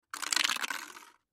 Can Crush Slide, Can Crush Slide Cement Aluminum Empty; Crunches